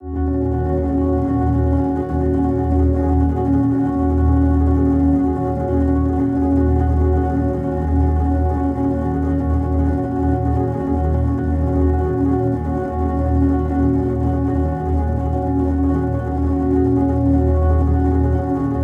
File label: FX Atmos Eb Min; ambient